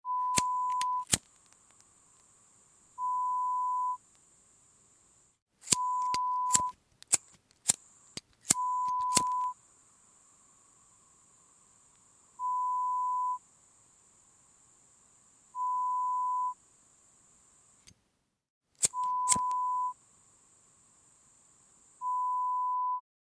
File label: lighter sound